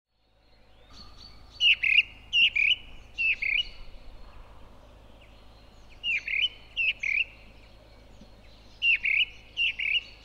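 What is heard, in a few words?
song thrush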